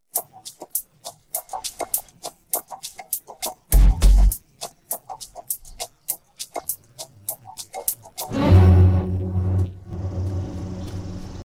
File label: happy no vocals